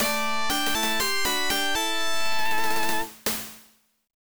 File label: Victory; Taikou Risshiden; Genesis